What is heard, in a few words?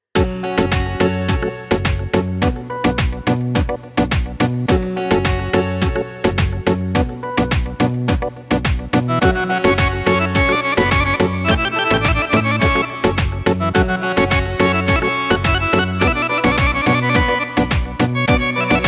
instrumental remix